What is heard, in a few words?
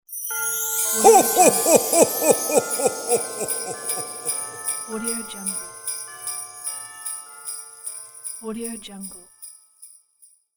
santa claus laughing